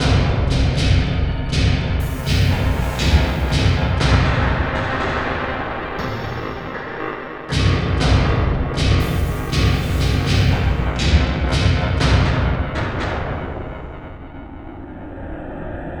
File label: Success Tonal B6